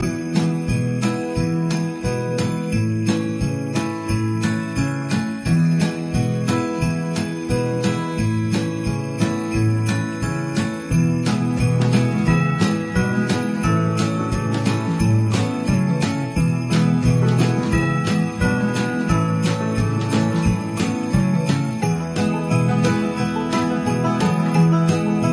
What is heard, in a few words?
Ingame Music Winter; Hay Day; android